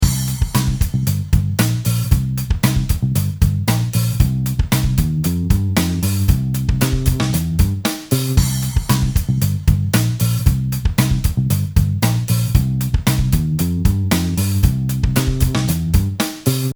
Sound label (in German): Drums und Bass Masked